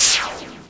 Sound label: Attack